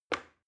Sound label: plastic button start release regular